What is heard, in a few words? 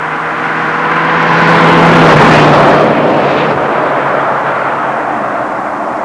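truck, Transport; Sound Library 2000; Wayzata Technology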